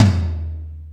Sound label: Toms, Drum Machines E-MU Pro-Cussion